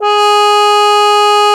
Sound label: HOFF G#4, CUP MUTE; Xsample VOL-3